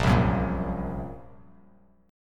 Bb Suspended 2nd Sharp 5th; Bbsus2#5; strummed; JGuitar

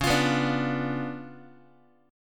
C sharp 7th Flat 9th, Guitar; strummed; chord; x,9,8,9,6,x

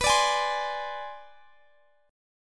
B Minor 6th Flat 9th B

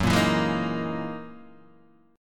F sharp 13th F sharp down Guitar DADGAD

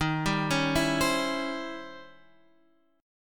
E flat 13th Flat 9th E flat; arpeggiated

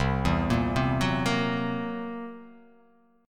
C 7th Flat 5th C down, chord

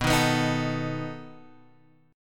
B 7th B down Guitar Open G

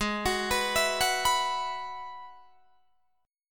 Ab Minor 7th Sharp 5th Ab, down, Guitar, DADGAD

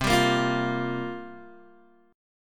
C 7th Suspended 4th, Guitar, Open G

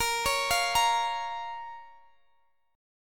Bb Minor, Guitar, Open D; x,x,20,19,20,20

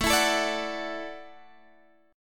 E 7th Suspended 2nd Suspended 4th A, guitar

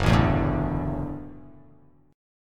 Gb Minor 7th Sharp 5th Gb; strummed; 2,0,2,2,2,x,x,x; JGuitar

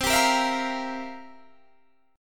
C 11th, C, down, Guitar, DADGAD

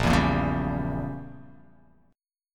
A sharp Diminished 7th, chord

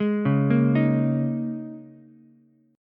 Bb Minor 11th Bb down Ukulele C6; arpeggiated; JGuitar

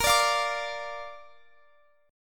A sharp Major, Guitar, Open F; strummed; JGuitar

